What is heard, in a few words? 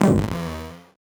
VocoBit Noise, 8-bit; musicradar; 8-bit bonanza